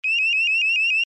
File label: alarm tone, sounder alarm tone; sounder beacon